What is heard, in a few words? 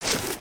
Pet Warthog Dig; creatures pets